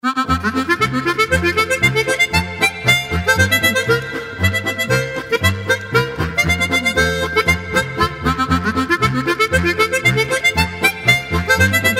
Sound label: polka